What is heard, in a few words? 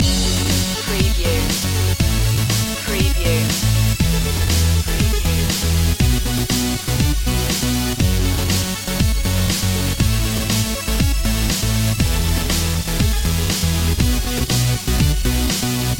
electro bass dance loop